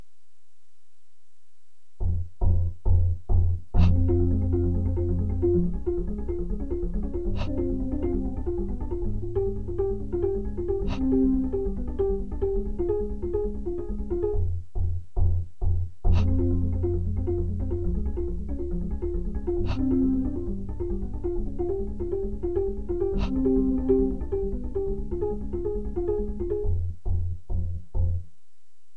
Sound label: harp